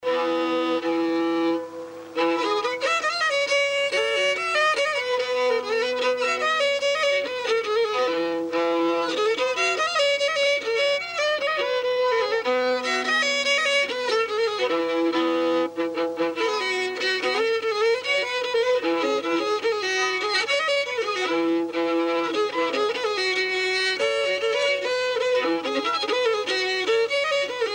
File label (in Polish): polka